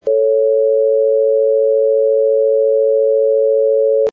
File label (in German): Grosse Terz Temp, Geige